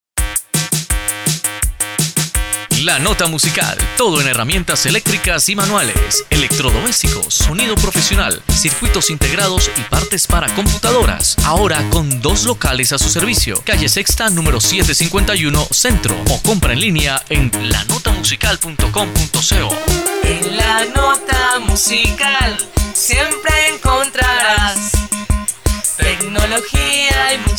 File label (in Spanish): jingle